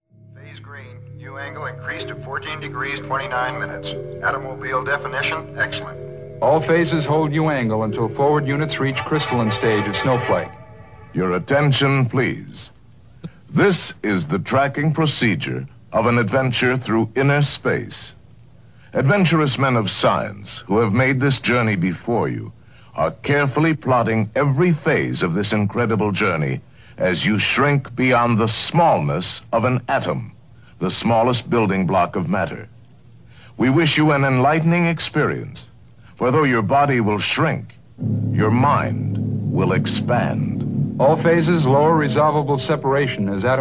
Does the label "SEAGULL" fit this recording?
No